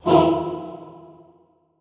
HOO E2, Classical Choir SHORT HOO; Best Service; Extended Classical Choir

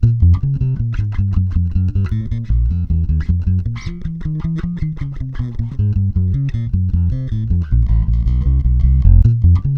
BLUES, Bass, 156 BPM; Sampleheads; Ultra Freakin' Bass

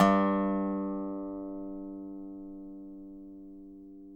DOBSUSG2 -R, dobro notes; Country

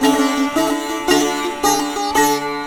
SITAR, Indian Traditions

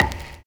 CABASS; Univers Sons - Kitch 70s

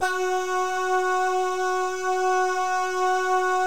BAH GUY, Vocal Planet Jazz & FX; ILIO; BAHS GUYS

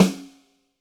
Snare, Roland TD-20

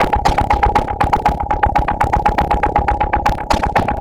Structured Rhythm, IDM Rhythms

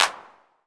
909 Claps; 300 Drum Machines

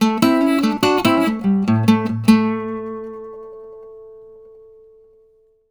licks, Blues Guitar; ILIO; Fretworks

